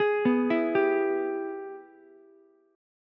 G sharp Minor Major 7th Sharp 5th G sharp down Ukulele; arpeggiated; JGuitar